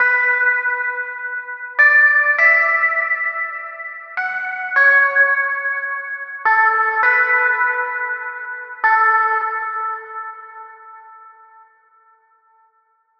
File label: Synth 102bpm Bm Lead Melodic Soul